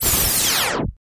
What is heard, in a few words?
Starship Warp Spd Pa